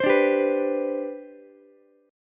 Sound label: C Major 7th Suspended 2nd C down Ukulele, chord; CM7sus2 strummed; JGuitar